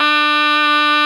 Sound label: DUDY D#3; SoundBytes - Early Patches